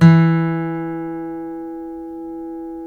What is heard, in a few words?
folk steel, guitars